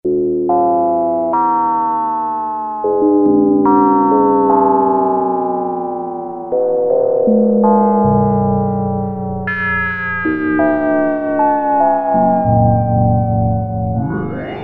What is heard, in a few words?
Descending Bells; Sequential Circuits Prophet-5 (Ver.3); Factory Patch